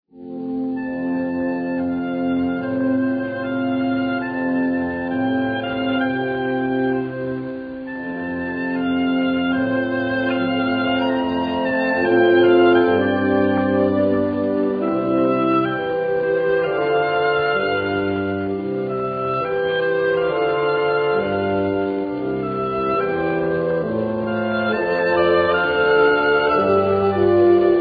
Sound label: Oboe